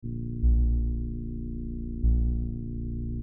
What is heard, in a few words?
menu theme; rund um die welten